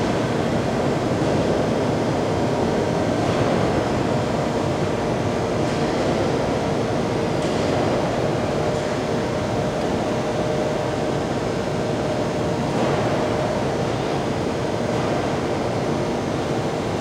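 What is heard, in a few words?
ambience warehouse; Pilot Down Behind Enemy Lines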